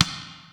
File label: Side, Yamaha SY22